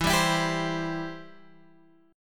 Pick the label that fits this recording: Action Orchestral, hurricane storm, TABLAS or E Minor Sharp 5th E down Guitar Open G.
E Minor Sharp 5th E down Guitar Open G